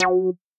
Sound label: synth